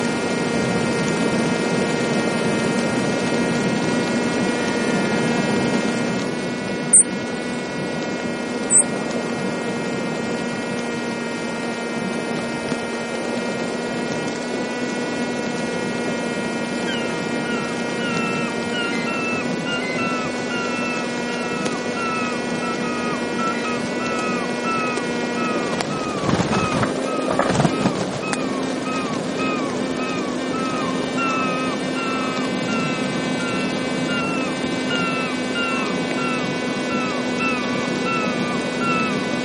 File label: Long Insect Event With Rich Harmonics; alaska acoustic highlights; INSECTS